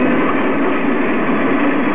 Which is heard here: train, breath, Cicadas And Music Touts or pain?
train